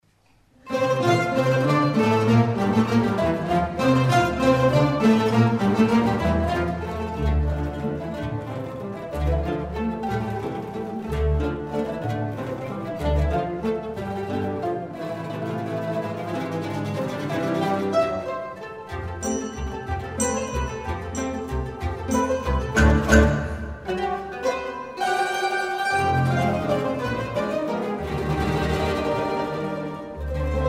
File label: eccentric waltz, Zupforchester